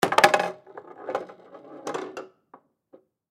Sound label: Foosball Table; Goal Ball Roll Ball Return